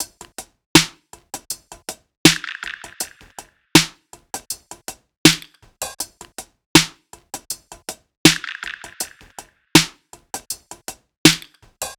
tops 80, Drum Sample Pack Loops Top Loops; SD SEISMIC; Slate

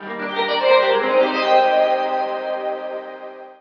pluck rainfall D#; Melodies; One Shots; Slate; AURORA Sample Pack

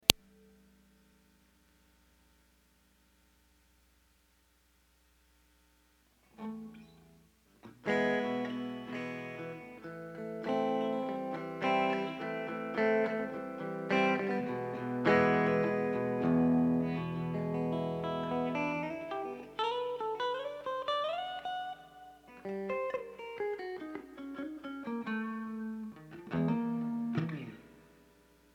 Ambient Guitar Mix

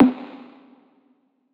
tom blip; Drum One Shots; Slate; SD MIDNIGHT Sample Pack

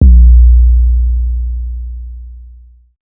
Synth oneshot Long A, Synth Bass; Synth Bass One Shots; Slate Digital BASSHOLE Sample Pack

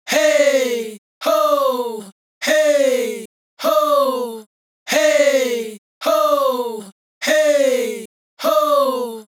adlib Hey Hoo, Vocal, Dry Vocals; Raw Vocals; Slate Digital Omnivox Vocal Sample Pack; Adlibs

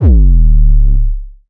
808 Bass Woofer Smash C3; Slate 808 Deep Dive; SD Signature 808s

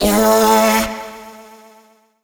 Vocal one shot A; Slate Digital Omnivox Vocal Sample Pack

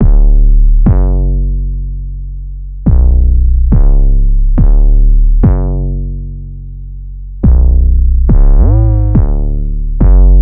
Synth loop 105 F#m, Synth Bass Loops; Slate Digital BASSHOLE Sample Pack

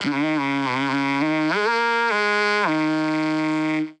phrase Ebm, Vocal; Slate Digital Omnivox Vocal Sample Pack

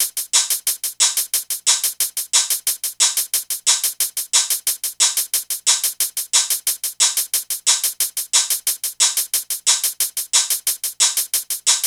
hat 90, Drum Loops, Hat Loops; SD MIDNIGHT Sample Pack; Slate

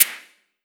Clap Lighter, 909; 909 Claps; Slate; Vintage Drum Machines Volume 3 909 Edition

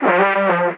vocal Gm; force; SD AURORA Sample Pack; Slate

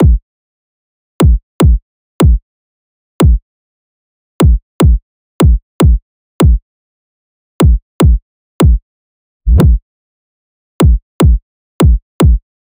kick 150, Kick Loops; Drum Sample Pack; SD SEISMIC; Slate

